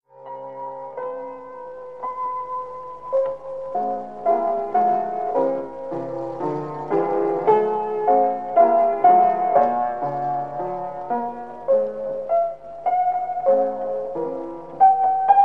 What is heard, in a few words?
piano, wire recorder